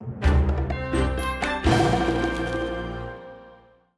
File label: Level Start Collecting; Scrubby Dubby Saga; Android